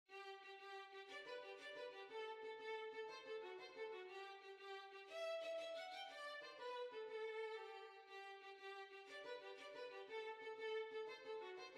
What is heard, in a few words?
Violin